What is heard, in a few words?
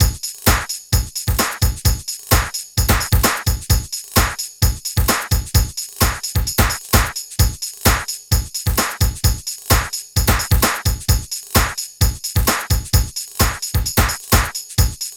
techno; INIS; Funky Xtreams